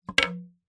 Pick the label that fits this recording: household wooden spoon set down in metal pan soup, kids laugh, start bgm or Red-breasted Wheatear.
household wooden spoon set down in metal pan soup